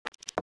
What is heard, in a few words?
Folder row close; Samsung; GT-S8600 Wave III; EffectTone